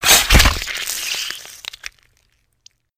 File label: Skill Inflict Wound